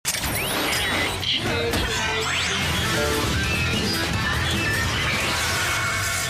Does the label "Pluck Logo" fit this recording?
No